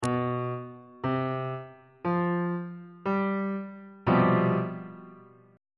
d double flat major 7th suspended 4th 3rd inversion on bass clef